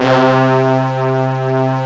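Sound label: RICH BRASS C2 left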